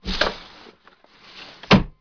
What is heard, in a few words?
household